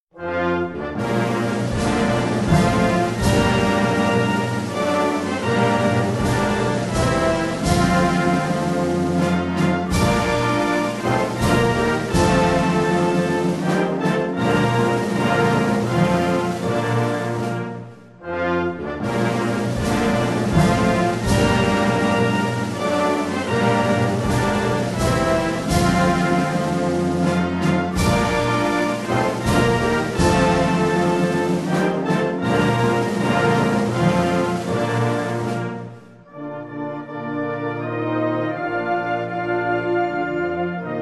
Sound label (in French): hymne instrumental